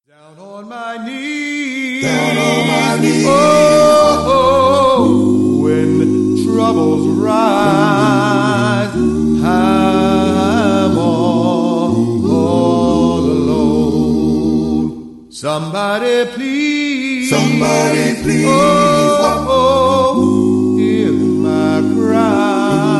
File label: quiet storm acappella